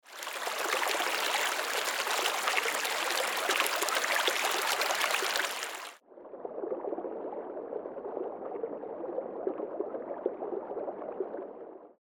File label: Underwater